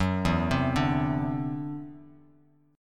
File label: E 7th Suspended 2nd Sharp 5th, E, down, Bass Standard; E7sus2#5; arpeggiated